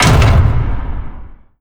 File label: settings open; ui